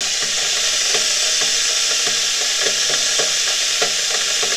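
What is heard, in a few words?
boiling water, Tea Party; SFX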